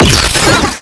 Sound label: Swarm Shoot; Swarm SFX